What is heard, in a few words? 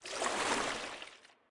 water pour; smite; god geb skin7